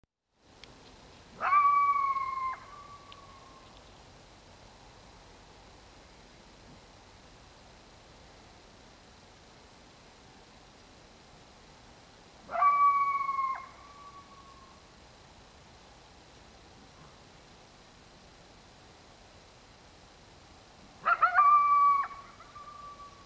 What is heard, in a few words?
coyote, night; nature